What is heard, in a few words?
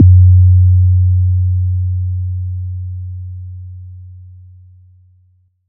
Tempest 808 Kick, Drum Machines; 300 Drum Machines; Dave Smith Instruments